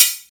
Present Open Hat F Key, Royality free open hi hat tuned to the F note. Loudest frequency: 8519Hz